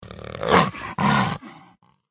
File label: attack voice middle; valdagor